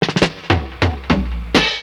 FILL SD, Ragga & Dub Reggae; USB Soundscan vol.27; FILLACOUS

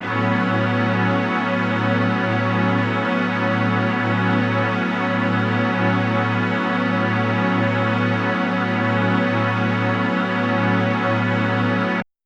KTron Ensemble A6:9, synth orchestra

